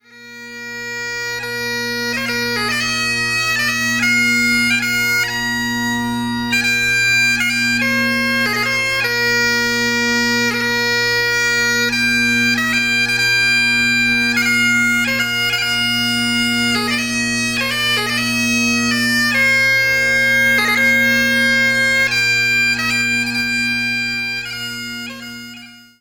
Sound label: Skye Boat Song, bagpiper